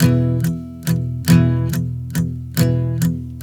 Strum 070 E, One Chord Strumming 070 bpm

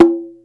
CONGA OP LO, RY-30; Hollywood Edge; The Big Bad Beatmachine

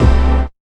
dance hit; E-MU Formula 4000 Series Vol. 2; Dance Hits

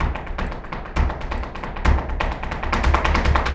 Flamenco, ZAPATEADOSOL

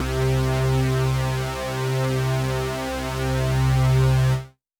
Pad C, Synth Prophet One Shots; Electronisounds

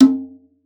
Conga, Session Instruments

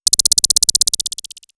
Space Cricket, synth fx; Concussion; X-Static Goldmine

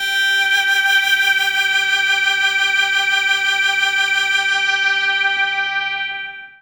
G, bright; Trance Formation; Shiver

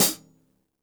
hihat - closed side; JazzFunkKit

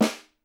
SN, Percussion

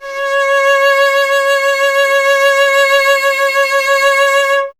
STR cello C#, Cello

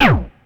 Scratch, Ensoniq ASR-X Pro

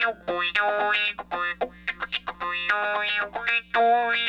Raw Funk Guitar

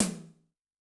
RoomQH, Tom, Yamaha DTXpress-II

